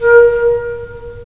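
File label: wind clarinet; LA Composer vol. 3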